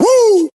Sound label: "woo"; Voice; ooh ahh